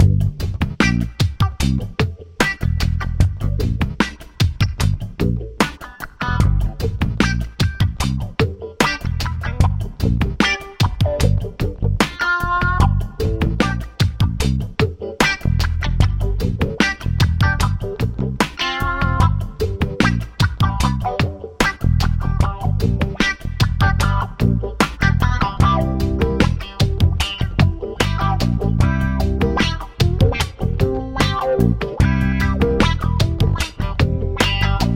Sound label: solo loop